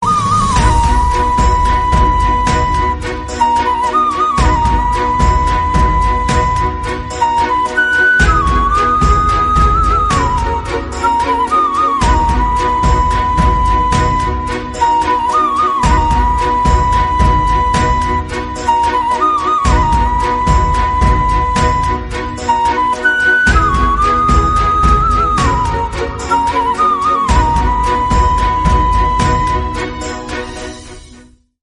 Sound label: Flute Ringtone